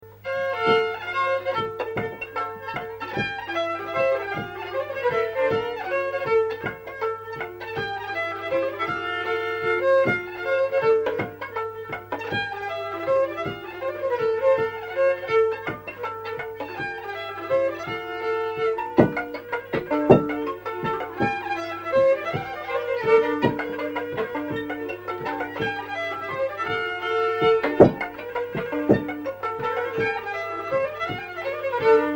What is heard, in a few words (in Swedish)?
loppolska